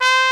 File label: THE TRUMPET